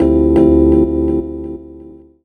Chords Tones; Equipped Music; Loungin House

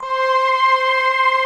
future choir, Chorus; Optical Media International; Sonic Images Library

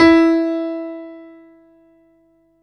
PIANO E3; Best Service Alpha Dance vol.2

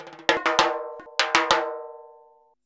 100 TIMBA, Modern Percussion Loops; Soundscan vol.56; AKAI

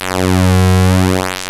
PULSE MOD; E-MU Formula 4000 Series Vol. 3; Analog Odyssey

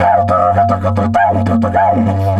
DIDGE 100BPM, Didgeridoo

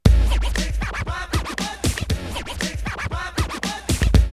Skraatched, drumloops OldSchool 117; X-Static Goldmine

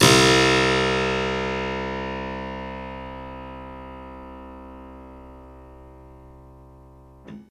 Piano Harpsichord c1; TrashLibrary

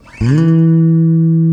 E BASS E1, FINGER BASS; AKAI S6000 CD-ROM - Volume 1

